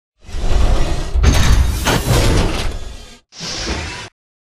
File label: melee bash; Titanfall 2